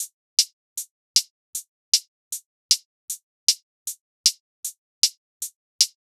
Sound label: Deathstep Kit 155bpm Hihats; EDM Drums; Ghosthack; Drum Mega Bundle Demo; Ultimate EDM Drums; Deathstep Kit 20